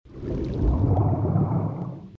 podvodnaja volna

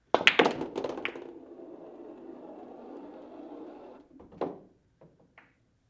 udar shar katitsa

